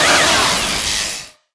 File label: force lightning end